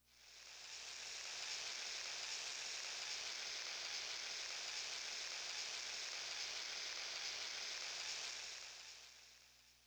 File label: Rain Prop